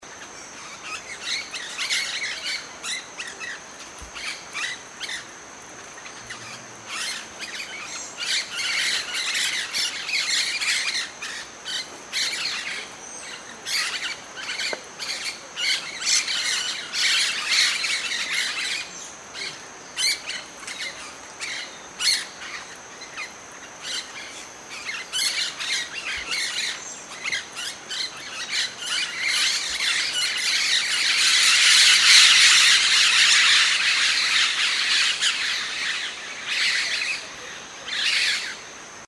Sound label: rose headed parakeet